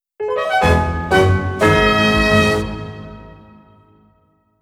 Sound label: Victory Fanfare; lost sphear